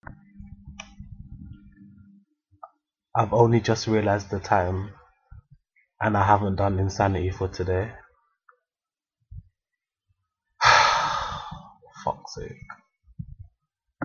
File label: sigh